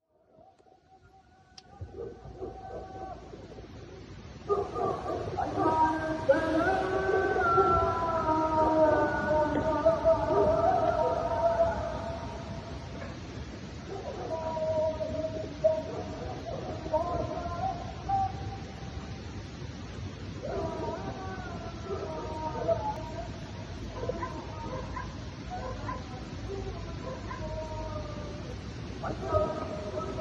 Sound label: call to prayer; Field Recordings; County Of Dust; Jeremy Dalmas